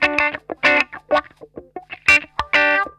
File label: SHRILL WAH, Funk Guitar; Zero G - Funk Guitar